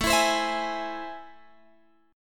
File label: A Minor Major 7th, down, Guitar, Drop D